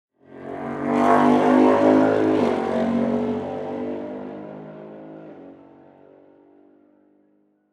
Speedway

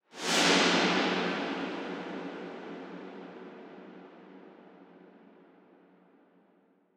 Deep Swoosh

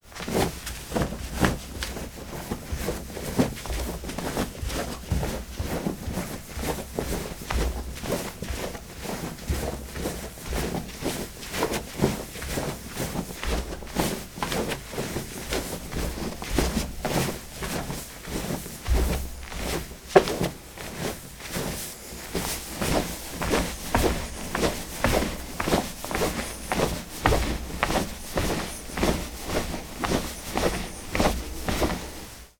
Conduroy Trousers Swift Walk

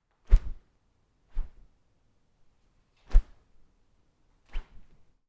Whip Paper

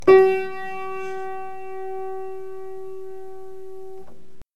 Piano

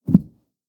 Thump

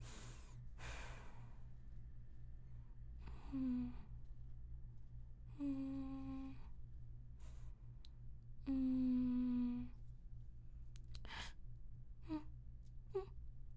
Scared girl

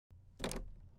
Door Knob

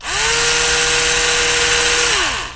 Electric screwdriver

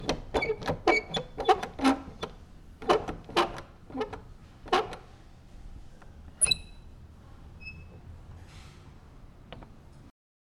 Metal Squeak

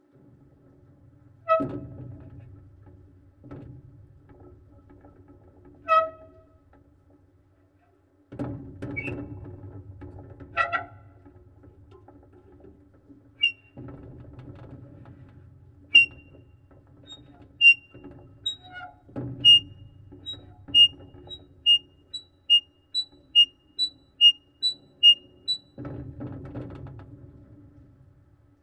Squeaking Metal Piezo

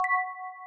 Bell Chime Alert

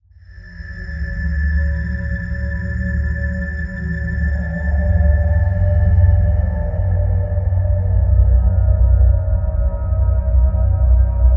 Soundscape Synth Ambiance Reverberation

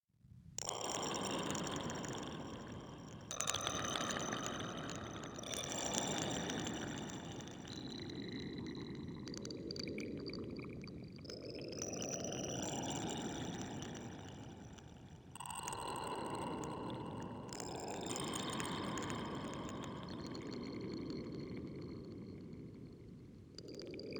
Monster Cave Amb

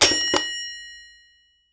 Katsjing

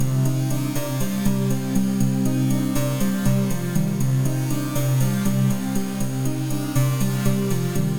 Synthetic Line Cmin; Ambienza

